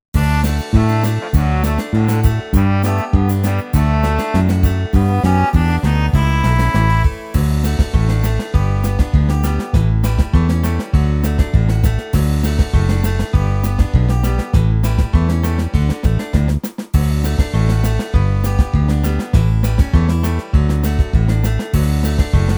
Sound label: Karaoke